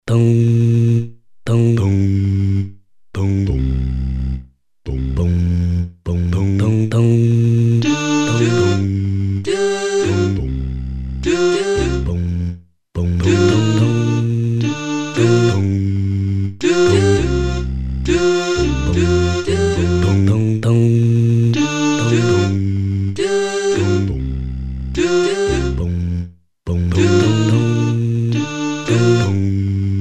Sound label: Bum Voice Doo Voices